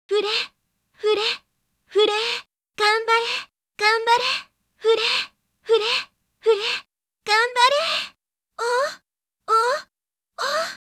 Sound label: cheer loop